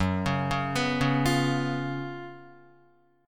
F sharp Major 7th Suspended 4th, Guitar; F#M7sus4 arpeggiated

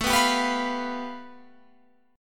A 7th Suspended 2nd Sharp 5th, Guitar, Open F; A7sus2#5 strummed; JGuitar

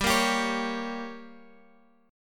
G Diminished, Guitar, Open F, chord; Gdim strummed; 19,17,19,20,x,x

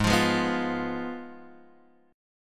Ab Minor 6th Ab, down, Guitar, Open G

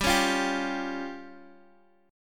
C sharp Major Flat 5th G

